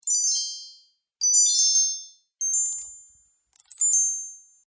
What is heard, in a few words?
Magical Turning Spell Collection